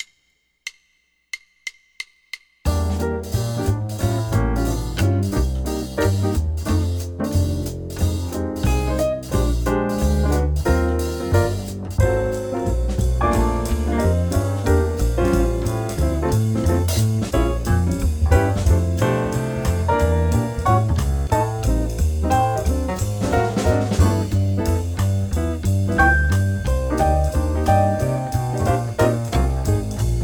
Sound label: all horns swing, jazz; LMEA All-State Jazz